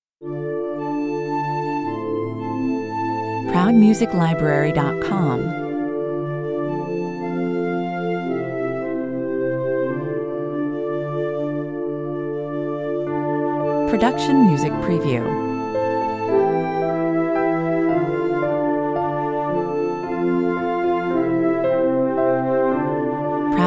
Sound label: 112 bpm, Piano, harp, pads, glockenspiel, flute and clarinet interprete this well-known lullaby; Romantic, calming and silent in a pleasant way.